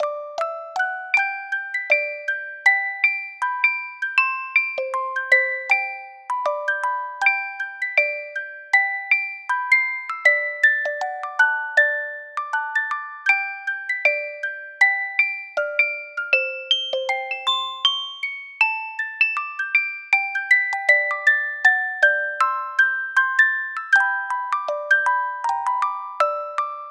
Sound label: Country, Music Box